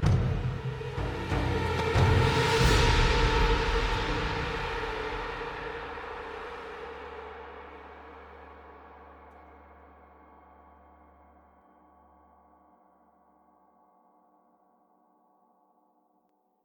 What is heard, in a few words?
drum; twistedtreeline; lol